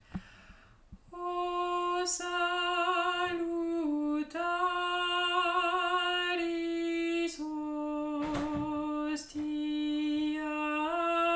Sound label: O salutaris alto